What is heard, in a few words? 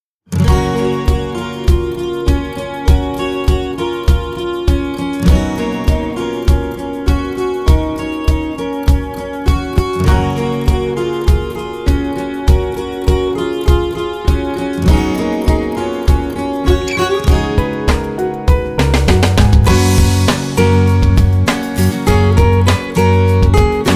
ringtong; Gionee V185